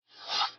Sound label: Motion Design Woosh; SoundsCrate